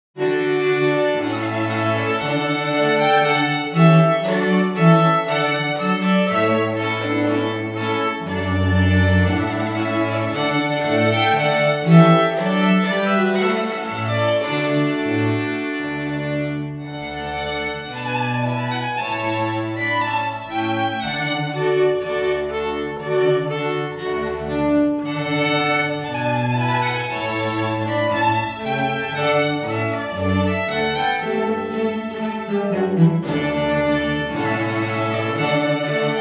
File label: Trumpet Voluntary